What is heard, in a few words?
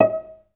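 cellos pizzicato D#2; Best Service; Peter Siedlaczek's Advanced Orchestra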